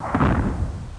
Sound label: Exp Small; America Invades! Demo